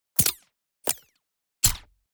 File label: Designed the shots, Metal Sweeteners; aXLsound